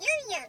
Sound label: yum yum cat